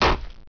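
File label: spear hit; Caesar 2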